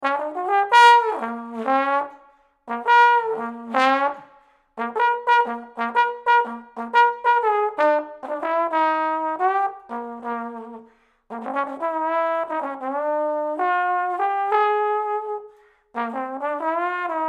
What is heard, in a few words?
Trombone